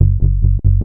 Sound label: Dub Bass, Bass Lines; Sonic Foundry (Sony Creative Software); Electronic Point Blank; Basses